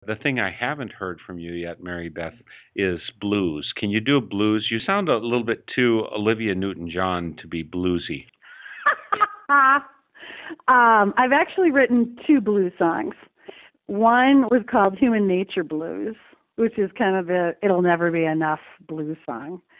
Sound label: blues